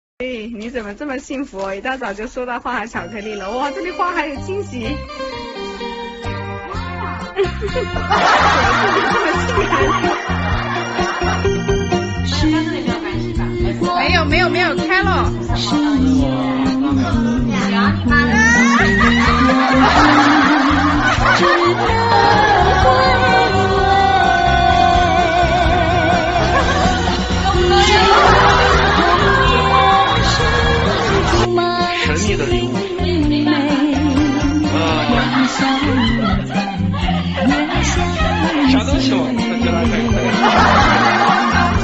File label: laugh; breadradio